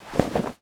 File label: Swish Clothing Rustle; Lucius III